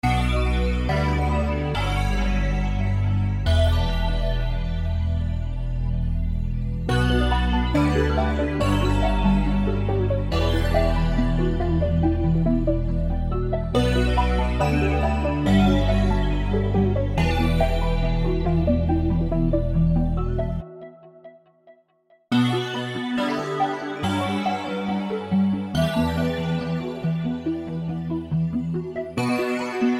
Glassy 140 E minor

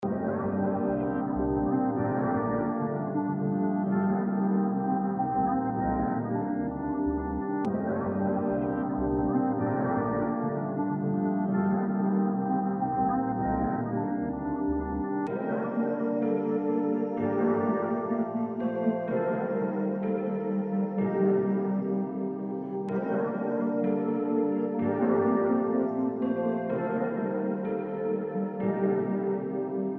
126 G minor ambient

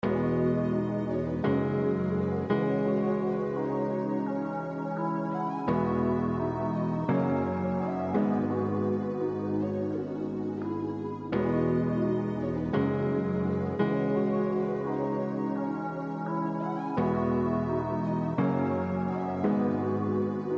Spring 170 G minor melodic

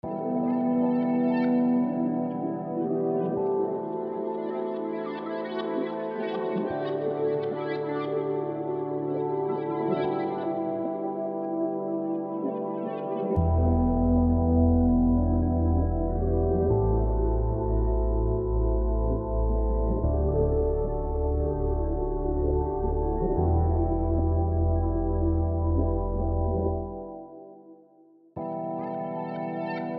Beyond Here 144 E minor spacey

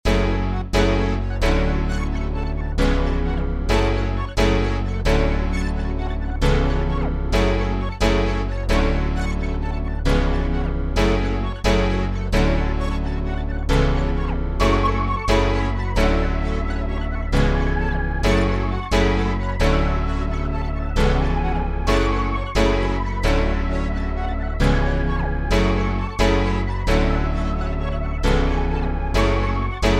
132bpm F min

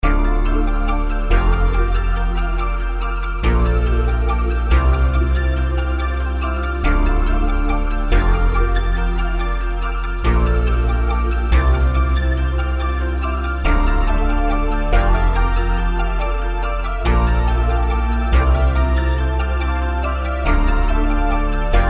141 F melodic

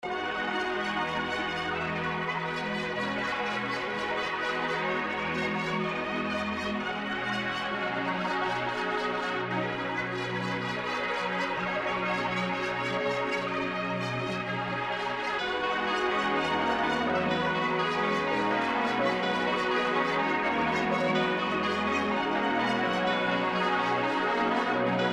125 B major ambient

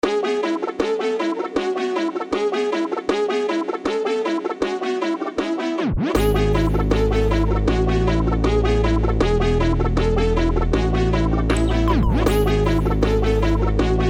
157bpm C min

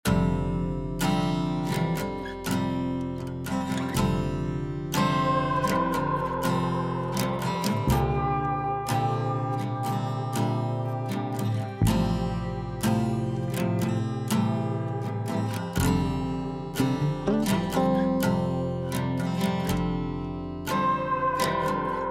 122bpm C major

